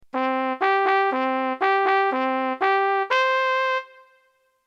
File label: alarm